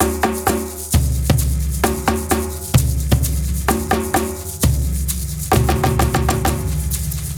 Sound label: Big perc A, латино